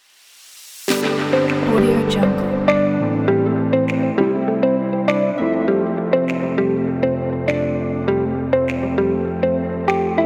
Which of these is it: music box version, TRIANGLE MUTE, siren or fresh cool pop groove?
fresh cool pop groove